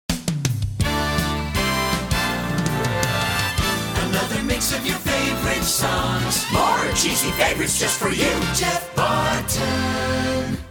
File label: Jinglebells